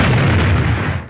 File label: explosion sound effect